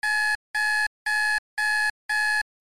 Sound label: BUZZERS